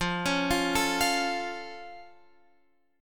F Augmented, Guitar, Open F; arpeggiated; x,12,13,12,12,12; down

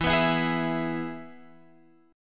E Major, Guitar, Open F; strummed; chord; x,11,11,11,11,11

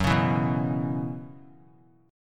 F sharp 7th Suspended 4th; F#7sus4 strummed

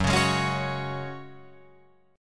Gb Suspended 2nd, Guitar, Open F; Gbsus2 strummed; 6,8,8,8,9,8

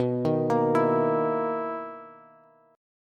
B Major 11th B down Bass; BM11; arpeggiated; JGuitar

